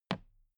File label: toolbox handle bounce soft